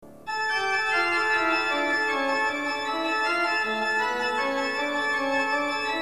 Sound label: organ